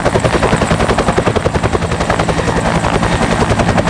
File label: chopper loop; Tony Hawk's Project 8; XBOX